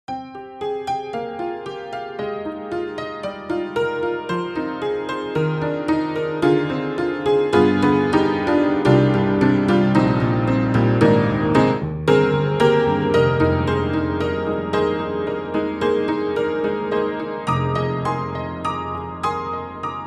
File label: Upright Pianos Barroom Upright Jazz Barroom; Synthogy; Ivory II